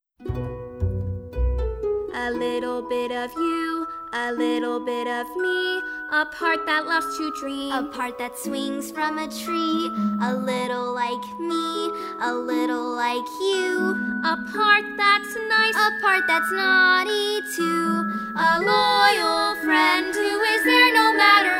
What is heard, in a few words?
vocal; young elsa